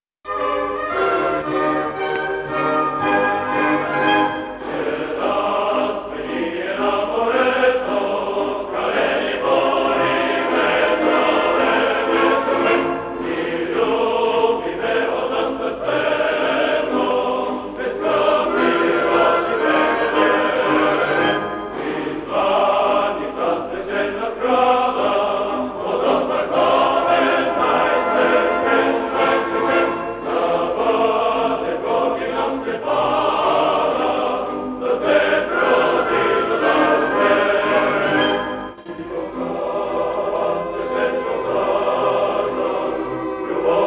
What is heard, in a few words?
hymn